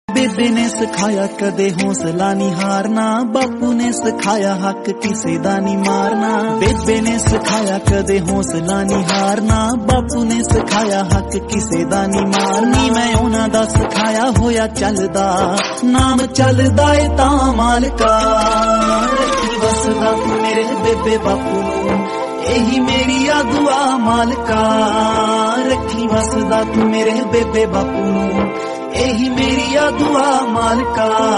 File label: haryanvi